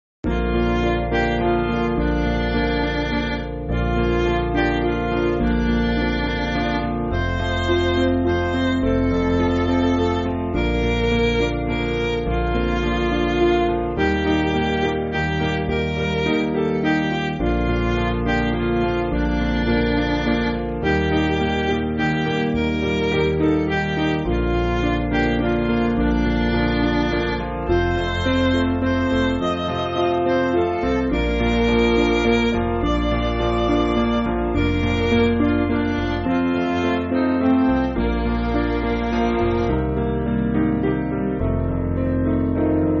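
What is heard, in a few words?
Midi, Backing Files for Churches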